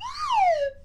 Fast Rise Fall, Slide Whistle

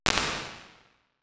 Retro Electric Sparks

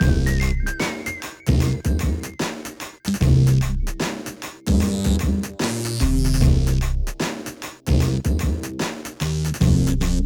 Junkstep, Loops; BB 2HTC Samples Vol 4

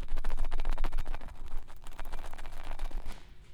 Weird Ruffled Thing; BB Retail Therapy Sample Pack

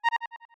locate, magic sound effects

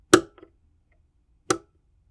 Model D - Switch, keyboard

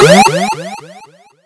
Retro Power UP

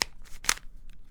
Big Cube - Twist, Rubik's Cube

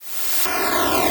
turnoff, Retro Sounds 32-Bit

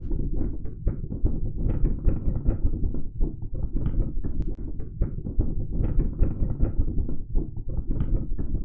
earthquake

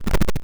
engine, square waveform; Square Waveform sfx

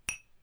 chisel, tools

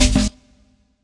Error Action; FREE SFX PACK VOL 2

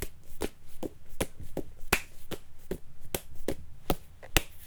Juggle the Melon; Micro Pack

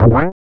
Bounce Back; FREE SFX PACK VOL 3

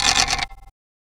Dice Throw; FREE SFX PACK VOL 1

